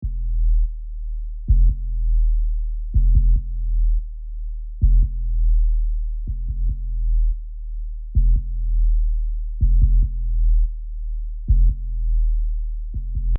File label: Trap Beat 808